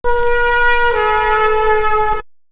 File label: Wind; AMG; Brass n Wind